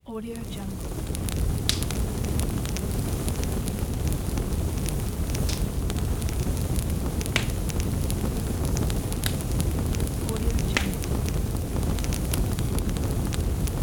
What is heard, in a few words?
the bonfire